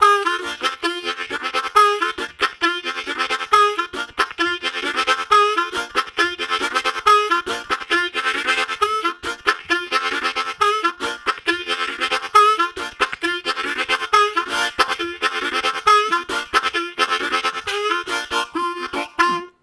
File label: Chugger Long, Harmonica, Riffs; Zero G